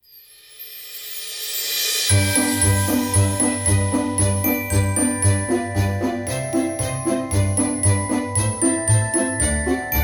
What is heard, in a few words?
Jingle Bells Orchestra; SafeMusicList